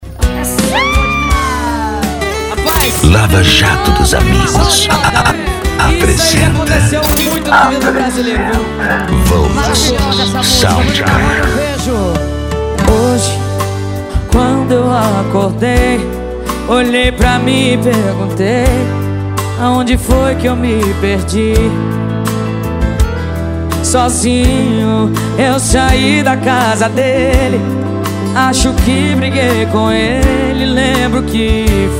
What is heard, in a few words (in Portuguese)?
sertanejo e pisadinha; cd volks sound car